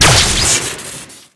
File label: pomson fire crit; tf